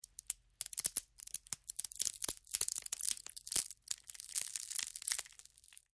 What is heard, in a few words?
Wood Break Snap Crack Foley; Small; Materials; BBFX